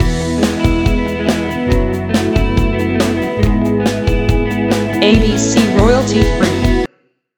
PopRock Loop

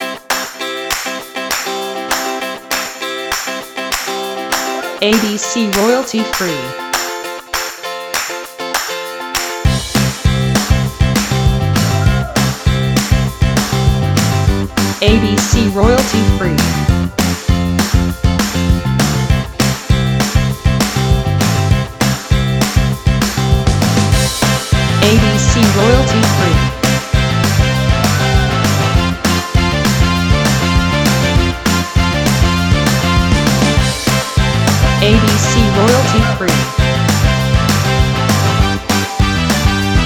Happy Summer Soul

